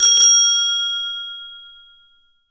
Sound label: Bike bell